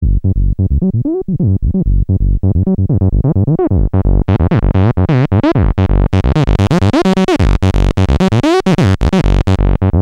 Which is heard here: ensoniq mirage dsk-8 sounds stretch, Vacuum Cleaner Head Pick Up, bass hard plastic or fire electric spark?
ensoniq mirage dsk-8 sounds stretch